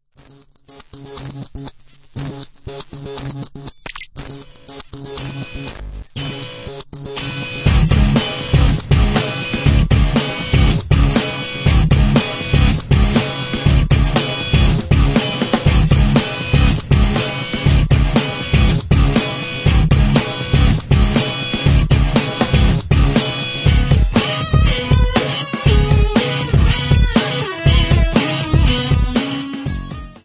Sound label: electro